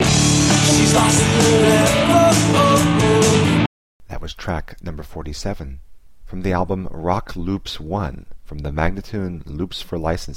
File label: 132 D spoken, Rock Loops; Loops For Licensing